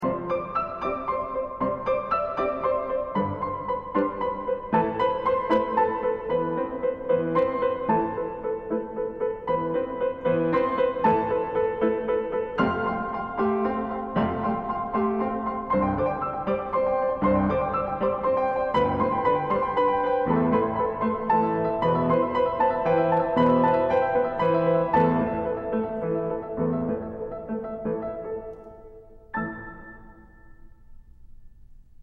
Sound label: Armenian Melody